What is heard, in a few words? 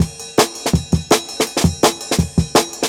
166, Jungle Breaks; 90s Sample CDs; Original Jungle Breaks